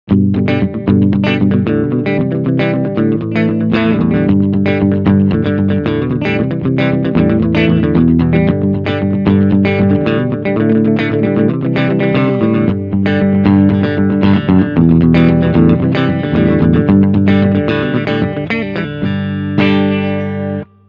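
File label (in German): Cleanpicking beide Humbucker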